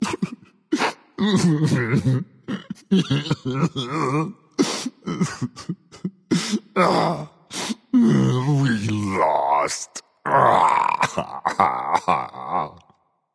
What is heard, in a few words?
boo hoo; smite